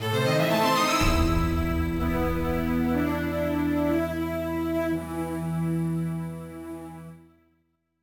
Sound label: Fanfare; Spectrobes Beyond the Portals